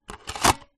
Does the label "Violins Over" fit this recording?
No